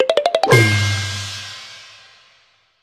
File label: Feedback Trophee; Kung Fu Rabbit; 3DS; android; PS Vita; PS3; Wii U